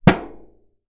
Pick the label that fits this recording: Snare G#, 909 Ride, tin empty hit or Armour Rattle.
tin empty hit